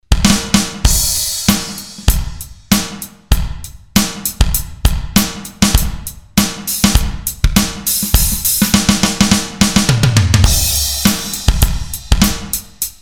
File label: edrum